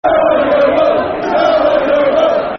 Come On Yeovil, Football Chant; Yeovil Town Ytfc; fanchants

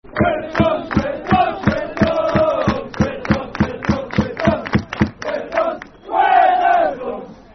Football Chant (Soccer Song); We're The Dons; Milton Keynes Dons Mkdfc

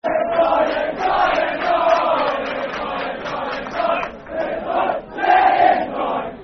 Let Him Die football chant (soccer song); Brentford

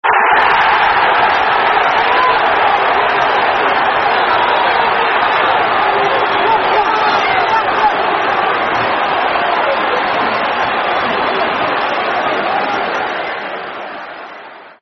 Football Chants (Soccer Song); Chelsea Score; Chelsea Cfc

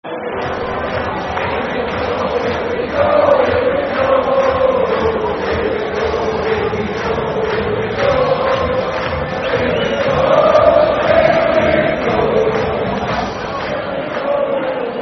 Football Chant (Soccer Song); Here We Go; Holland Hfa Netherlands

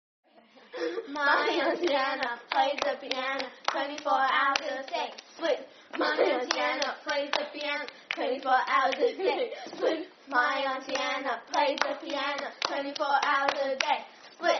Clapping

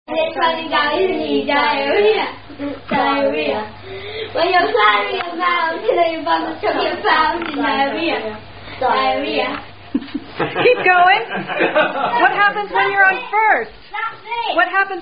Diarrhea Song; London

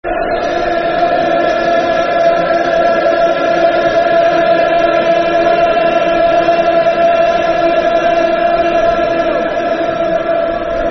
Football Chant (Soccer Song), Man United; Eric The King

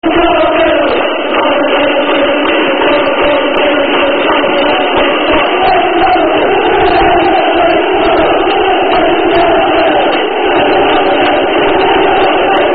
Chelsea Chant; Ten Men Went To Mow

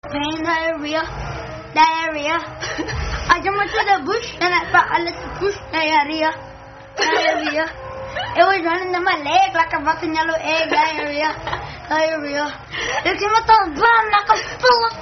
Rap; Diarrhea Song

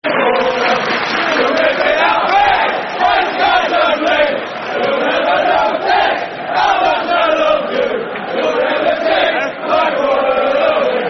You Are My Boro, Football Chants; Middlesbrough; Middlesbrough Mfc

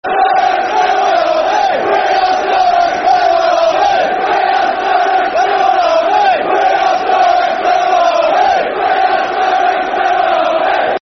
Football Chant; Neil Sturrock's Red And White Army; Swindon Town Stfc

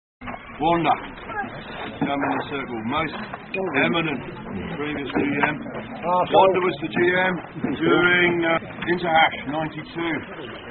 Unknown Hash Song

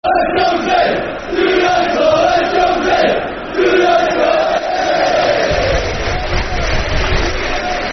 Football Chants (Soccer Songs), Cardiff City

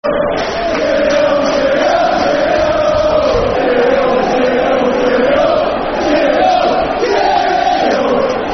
Cheerio Football Chant; Bury; Bury Bfc

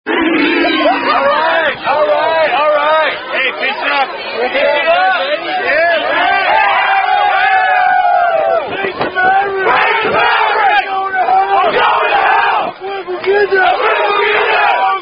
Rugger Chant, Unknown Rugby Chant; Mesa Rugger Chant

